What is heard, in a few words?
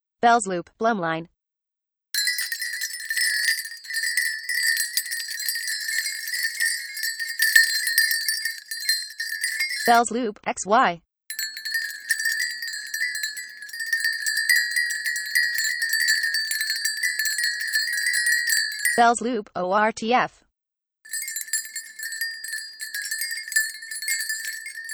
Stereo Loops